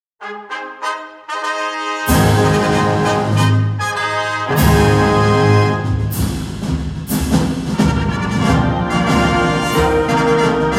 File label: Marines Fanfare